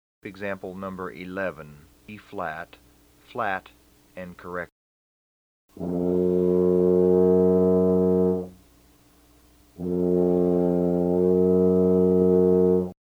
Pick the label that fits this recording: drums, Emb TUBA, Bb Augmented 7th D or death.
Emb TUBA